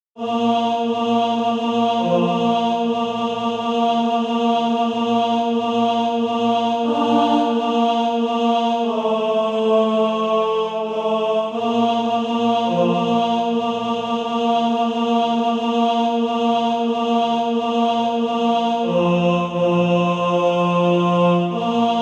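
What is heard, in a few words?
tenor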